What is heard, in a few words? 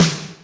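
Snare Studio B, Alesis D4 drum machine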